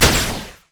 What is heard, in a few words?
ranged attack; LoL; jayce